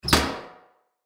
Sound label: Garbage Can Lid Close Bathroom Metal; Office; Blastwave